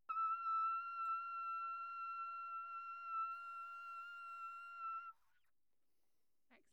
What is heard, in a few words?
handel trumpet high, Anechoic; OPENAIR